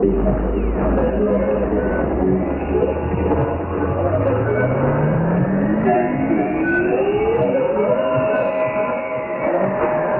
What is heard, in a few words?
cymbal Stereo